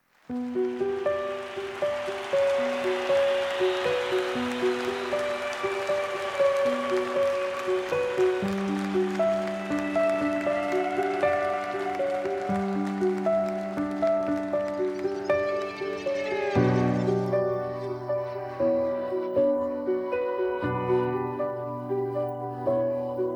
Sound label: Acoustic